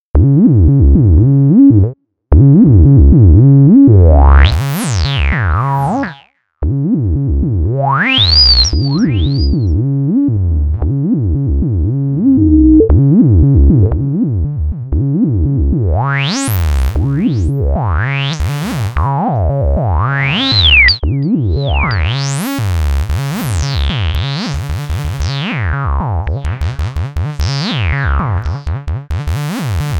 Like a Nord Bass

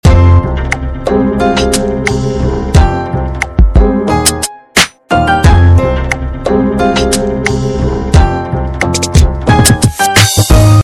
89bpm

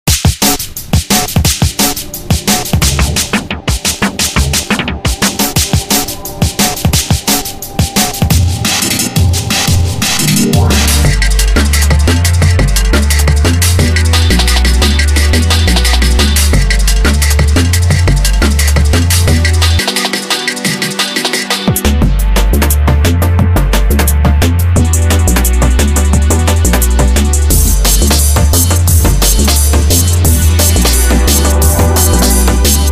kit

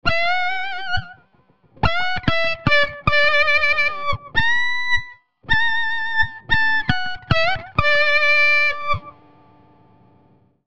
Microsynth Lead

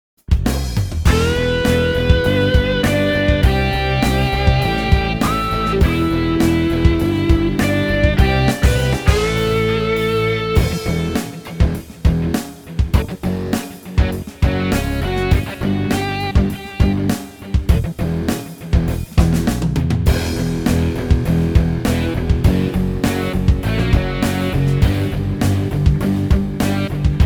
English Rock

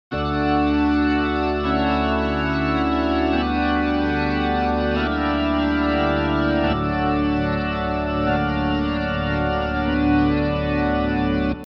rock Organ

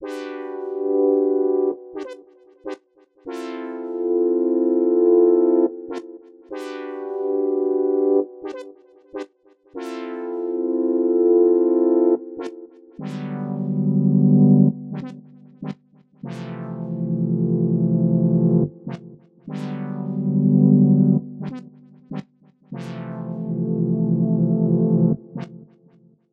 Old Analog Brass

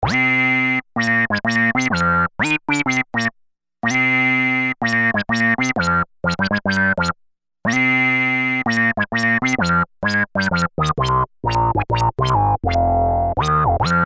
analog daze

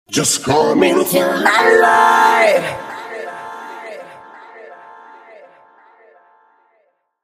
Back Vocals Harmon Speed

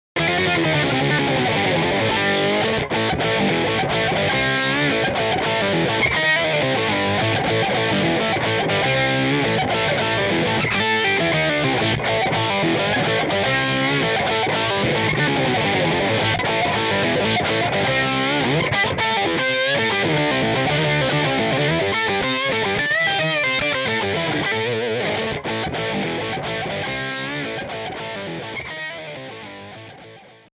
Octaveman Tone Lab

